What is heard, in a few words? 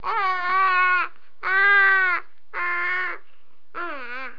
cooing